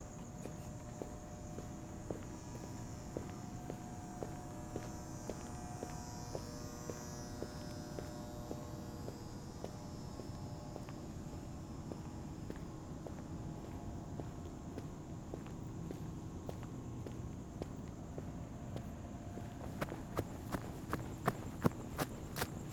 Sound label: Footsteps Walking Buzzing Light Alley Park Calm Night